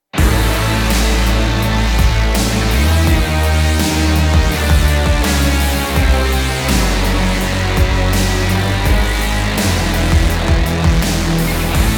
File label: Agitating Point Loop; winter memories windows gamerip 2024